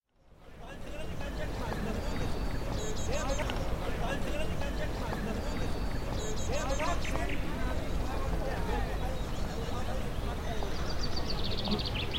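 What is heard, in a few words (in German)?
Dorfgeräusche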